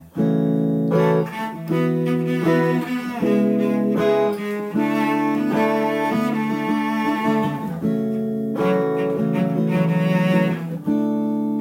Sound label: strings